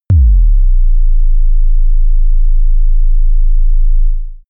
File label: sub kick sustained